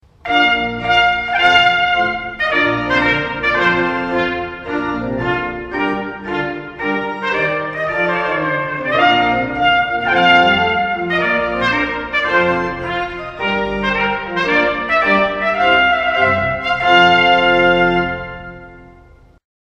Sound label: trumpet tune; purcell; hochzeit